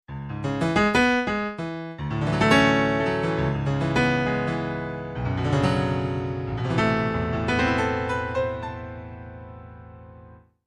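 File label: Grand Piano Bright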